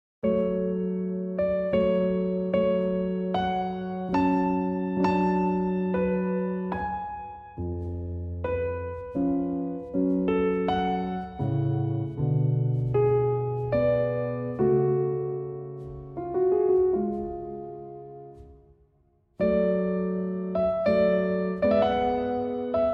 Piano Concerto in A Major, Andante

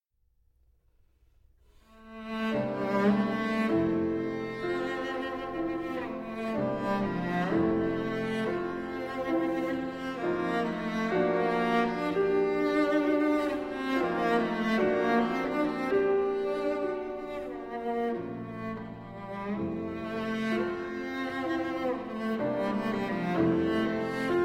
Pieces For Cello and Piano, Prélude

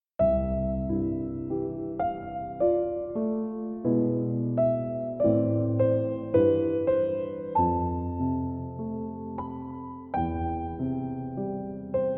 Sonnet in C Major

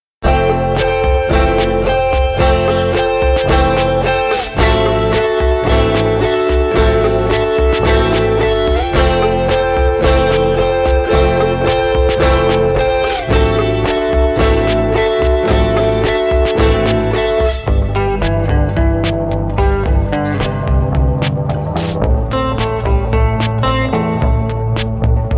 Instrumental

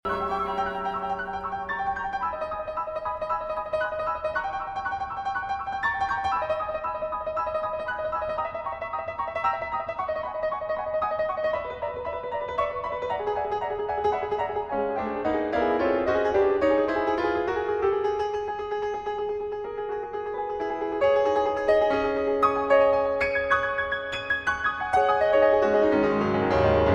Piano Concerto, Cadenza & Coda; Abrams

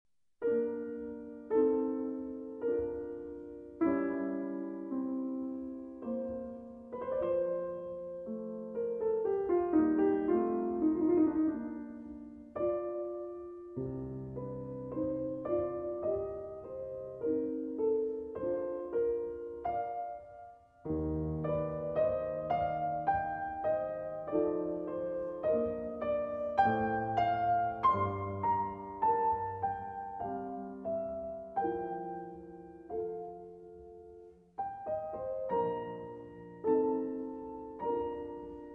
Piano Sonata in C Minor, 2 Pianos; No. 14; Arr. For 2 Pianos By Edvard Grieg

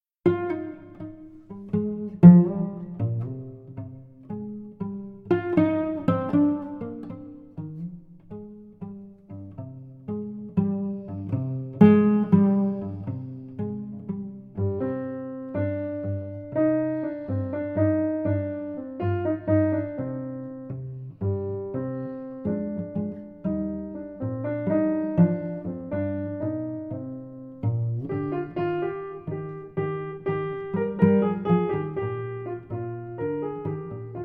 Lullaby Cello and Piano

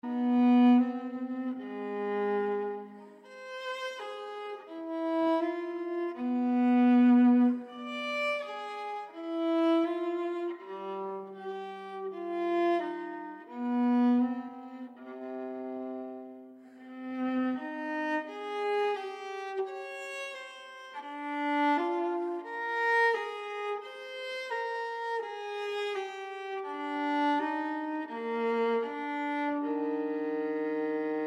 Sarabande, Performed on Viola; Cello Suite No. 5 in C Minor, BWV 1011

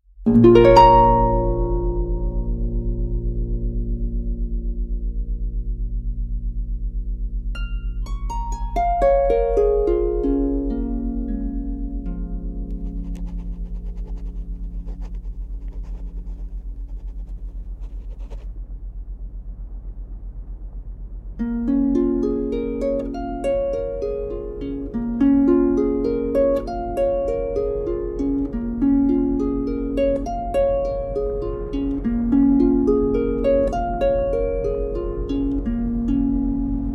Harp Note; A Harp Note to Emily Dickinson

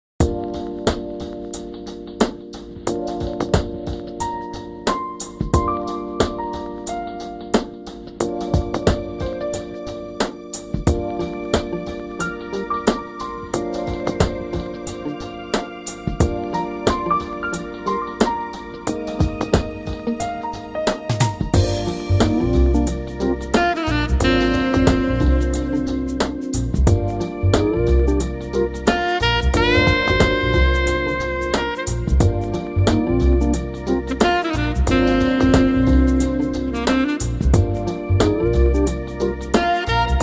Chillaxing Jazz

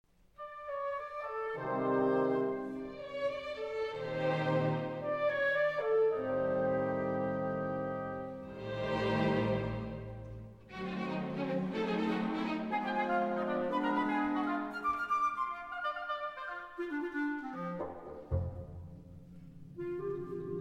Piano Concerto in One Movement, Andantino; Price; Allegretto

